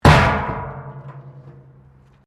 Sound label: METAL BIN IMPACT PLASTIC CRASH BARRIER